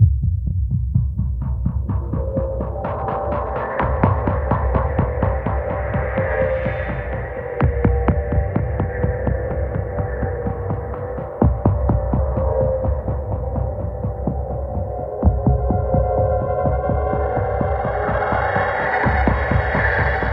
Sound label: Sneak